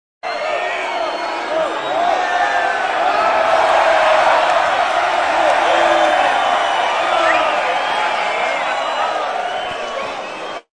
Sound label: rowdy crowd; Sound FX Real Life